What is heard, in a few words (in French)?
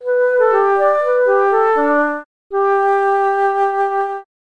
carillon